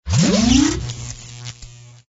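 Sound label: fish bubbles; monkeyfish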